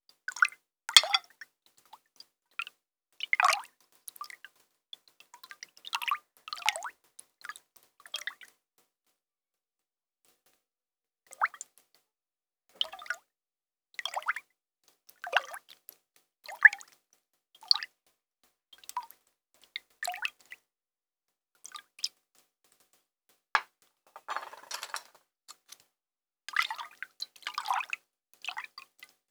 Thermes

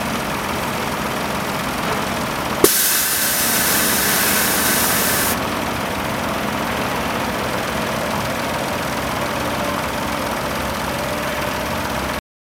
rhone ambiance chantier surface; arles

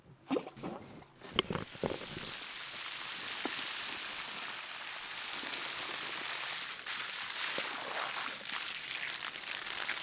Jet diff surfaces

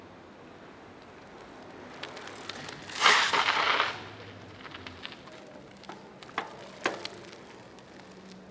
BRUIT Velo freinage

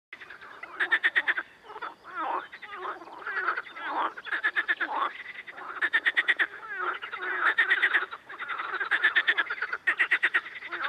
AMB Amphibiens; AMBIANCE

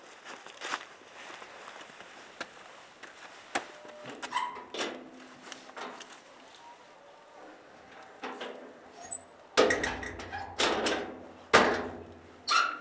Mecanisme

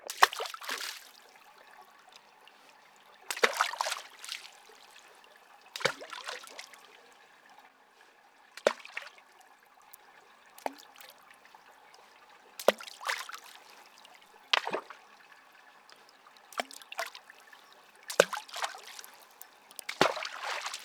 BRUIT Eau cailloux

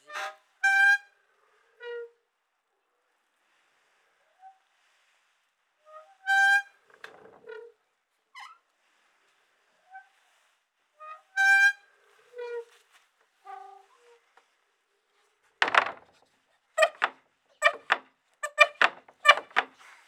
mecanisme grincement

